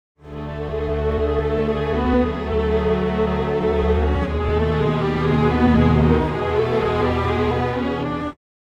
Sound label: strings